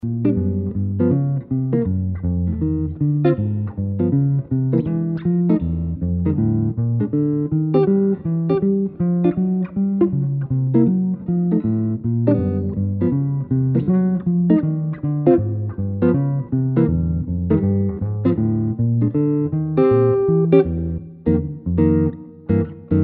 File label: Rhythm Changes Walking Bass Comp; instructional materials; jazz tunes